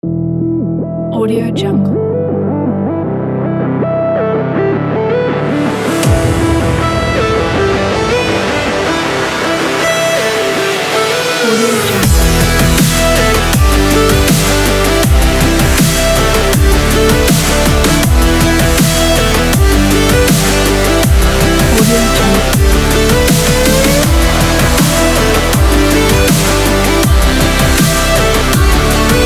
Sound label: pop electronica